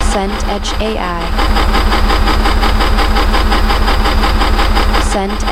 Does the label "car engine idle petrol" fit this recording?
Yes